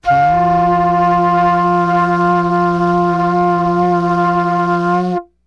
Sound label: FL HUM F5, FLUTE HUM; Greytsounds - Philip Wolfe David Crozier's Saxophones